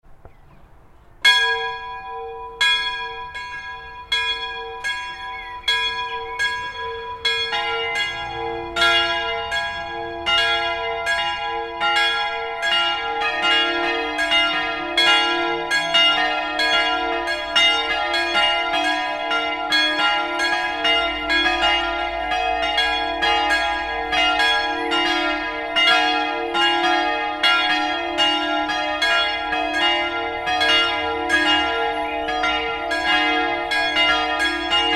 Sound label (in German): Glocken Mariabrunn